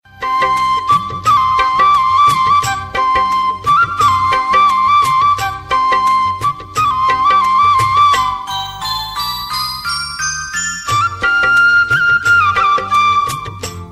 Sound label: old flute; mallu